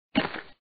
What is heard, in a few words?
landing; stack jump